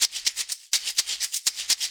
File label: Percussion Loops, Shaker & Tamb; Houseworx